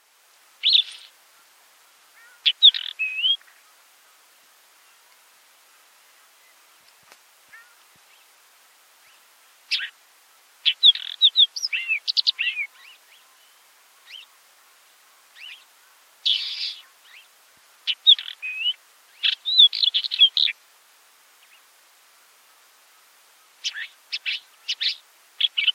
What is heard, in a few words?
Capped wheatear